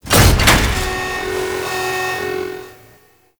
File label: Door Unlock; Gameplay; Awakening Remaster